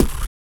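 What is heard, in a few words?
vox percussion, Vocal Planet Jazz FX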